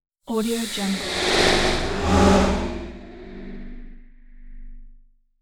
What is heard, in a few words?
scary vocal whoosh